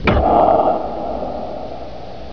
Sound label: catch button sound